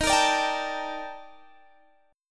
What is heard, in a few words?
Eb Major Sharp 11th, Guitar Standard; strummed; chord